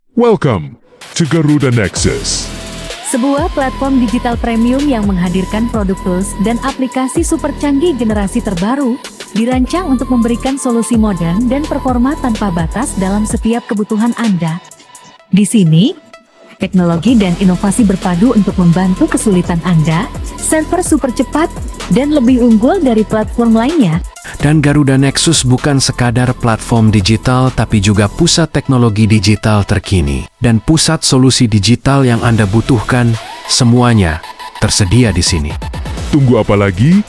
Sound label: ui music